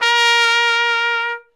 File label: SOLI TRP, Alpine Volksmusik TROMPETEN; Best Service